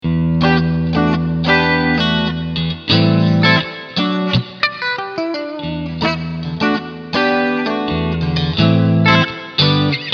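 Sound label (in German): Singlecoils Steg Clean, guitar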